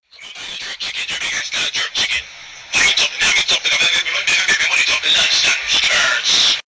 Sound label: halarious jamaican jerk chicken ringtone